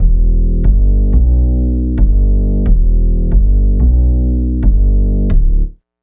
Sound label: Synth Bass